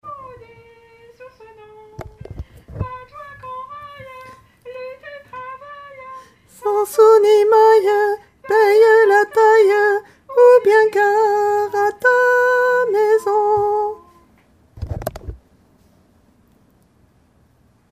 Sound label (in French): guerre des paysans tenor